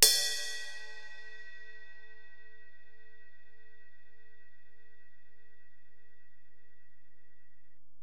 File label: Ride, Alesis DM-PRO, Drum Machines